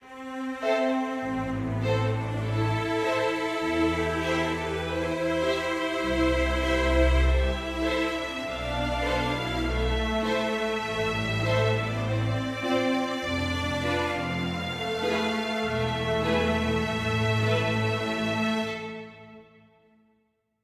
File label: adventure forte